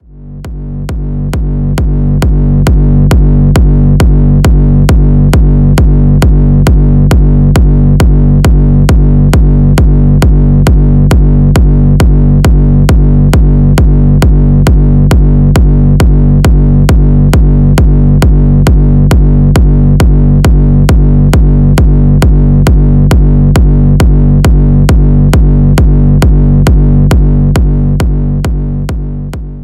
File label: signature kicks; zumstein